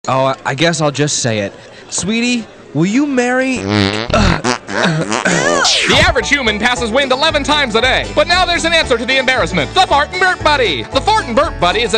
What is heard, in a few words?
Fart And Burp; You Don't Know Jack